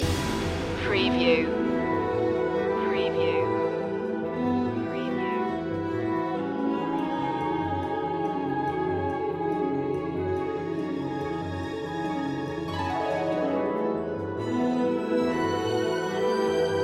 voices on the wind loop

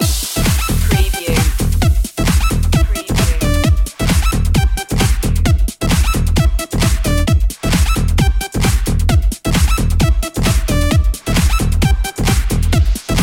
laser motion

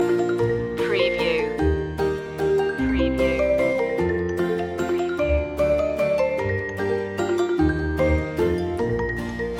we wish you a merry christmas orch loop; media music now

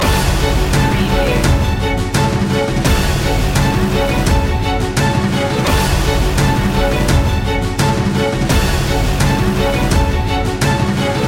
besieged loop

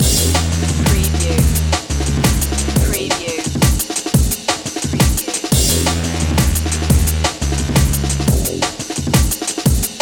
stimulation loop

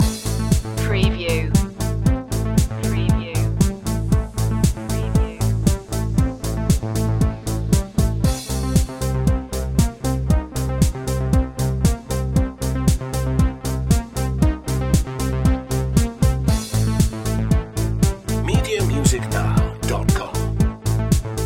technology beat loop

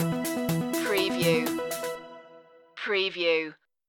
news flash outro

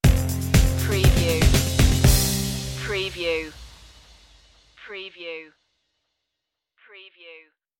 reflections stinger

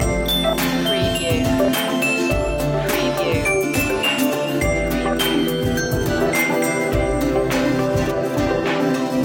summer picnic loop

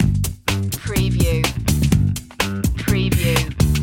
loop bass

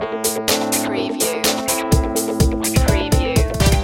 disco funk loop

